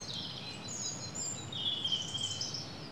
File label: birds, ambient animal; Counter Strike Model Pack; CS:GO